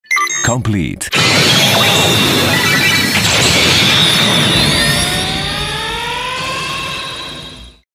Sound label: henshin sound; next faiz accel